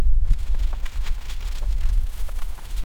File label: bear in the snow